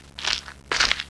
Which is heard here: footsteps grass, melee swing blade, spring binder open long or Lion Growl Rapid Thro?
footsteps grass